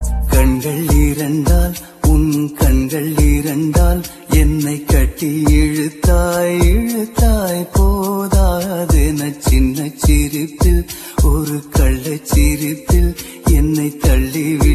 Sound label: Tamil Fusion